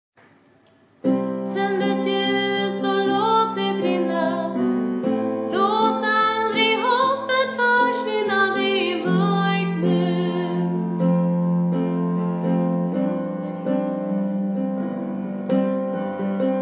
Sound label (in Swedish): overstamma